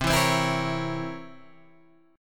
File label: C 7th Flat 9th, Guitar, Open G